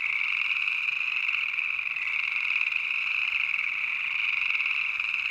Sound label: Ambient Frog - loop